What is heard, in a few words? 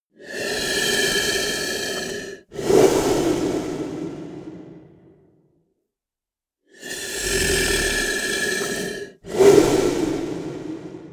monster snore breath; koerpergeraeusche; comedy-cartoon; soundpool